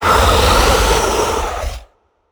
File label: wild dragon; DragonsLucky8WD; wazdan